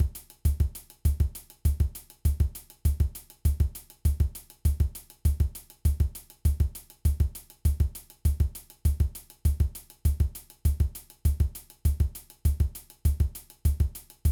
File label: Samba Beat